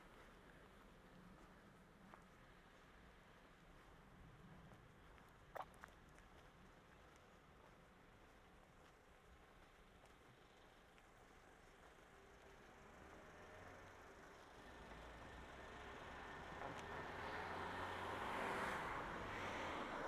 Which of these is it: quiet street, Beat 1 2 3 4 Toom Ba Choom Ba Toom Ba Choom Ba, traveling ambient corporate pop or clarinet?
quiet street